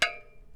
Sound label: splat metal thick; splat metal thick clip; splats